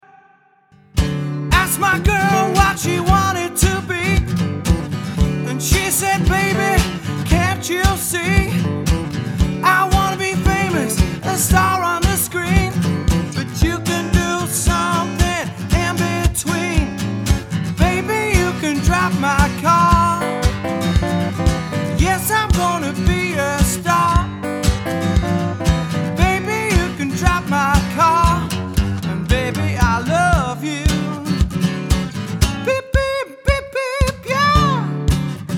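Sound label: Solo Acoustic, Singer Guitar Player; Guitar Vocal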